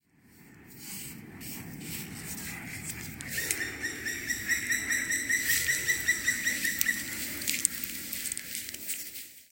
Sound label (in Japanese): kumagera, bird song